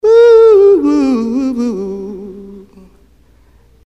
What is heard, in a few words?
Whoo; ringtones